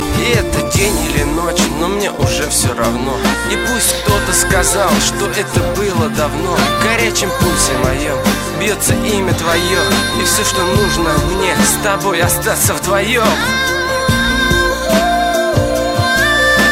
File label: Russian